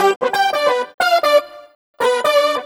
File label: Breezy Riff, Funk Keys; Sonic Foundry (Sony Creative Software); Synchro Funk